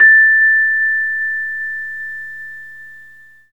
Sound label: Rhodes A; 3D Sound; 3D Keys VOL-1